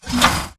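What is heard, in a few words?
Stalker Movement Servo Damaged Walk; Titanfall 2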